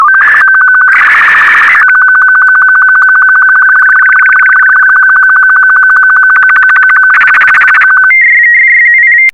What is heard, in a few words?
sstv; R8BW